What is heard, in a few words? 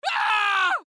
Scream